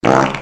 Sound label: fart button slowed